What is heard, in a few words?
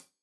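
hat closed, hi-hat cymbals stereo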